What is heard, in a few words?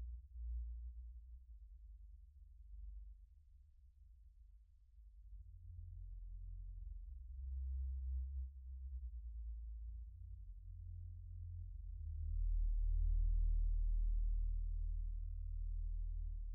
80Hz